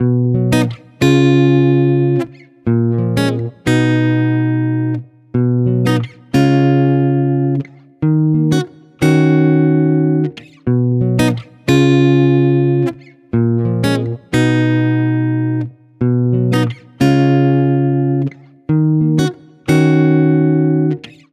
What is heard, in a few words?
Guitar 90 BPM (A#maj); Guitar Loops; Artifact; Ghosthack; Guitar Loops & Shots